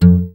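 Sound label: GUI Ac Dmp F, acoustic guitar; Best Service Gigapack 2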